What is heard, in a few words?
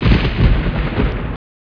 THUNDER; JOHNNY